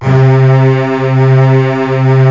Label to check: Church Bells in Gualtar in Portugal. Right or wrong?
Wrong